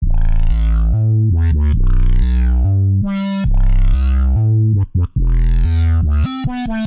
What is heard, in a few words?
low analog bassline